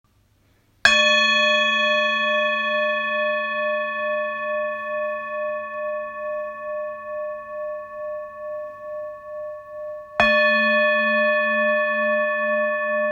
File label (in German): Klangschale